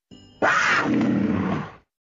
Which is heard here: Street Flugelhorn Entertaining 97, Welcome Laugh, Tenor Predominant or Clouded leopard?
Clouded leopard